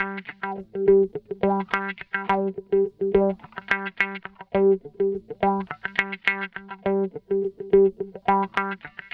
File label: 105 Cmaj SUGARFUNK GTR; 105 BPM